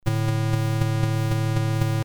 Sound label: dpcm